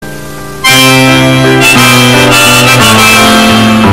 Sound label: piano bass boosted